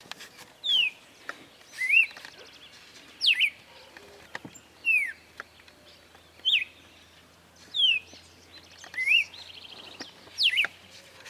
cardenal copete rojo voz; aves

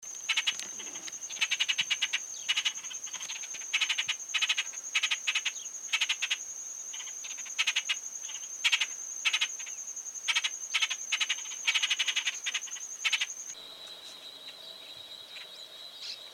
voz pecho amarillo, aves

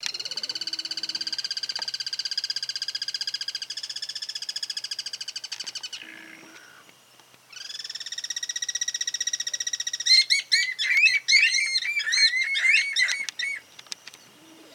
pirincho voz, aves

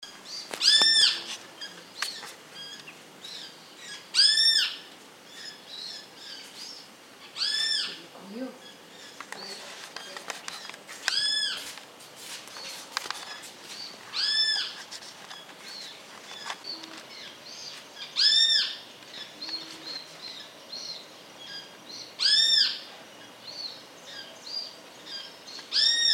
Benteveo llamada a los pichones, aves

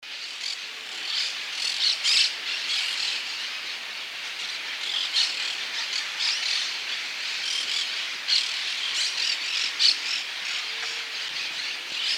cotorra Voz; aves

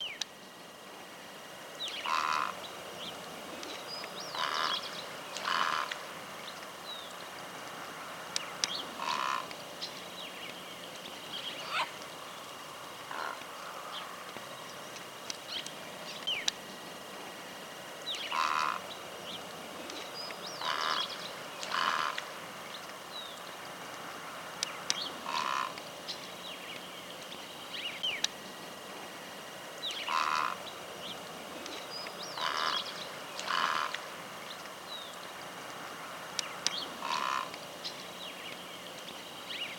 garza blanca voz; aves

